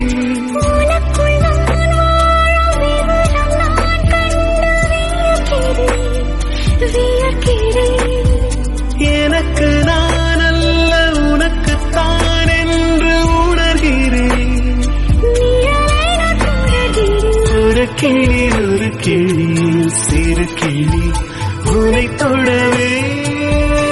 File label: Tamil Song